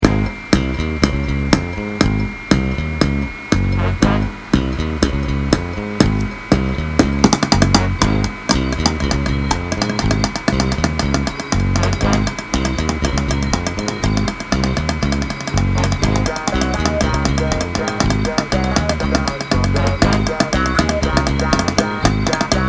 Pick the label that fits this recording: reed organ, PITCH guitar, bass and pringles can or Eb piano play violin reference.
bass and pringles can